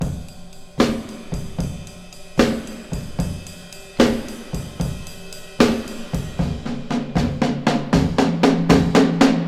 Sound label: 114 bpm drum loop f sharp key, Free breakbeat sample - kick tuned to the F# note. Loudest frequency: 820Hz